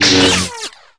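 Light Saber ricochet, Contacts avec d'autres sabres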